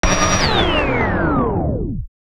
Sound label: TAPE VINYL 175; KULTURE